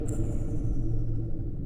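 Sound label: Music Dpad Evaporate 145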